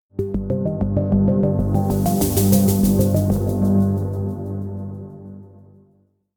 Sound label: Scifi Audio Logo Ident Dreamy Sequential; Tangerine Dreamy; Casino Sound Effects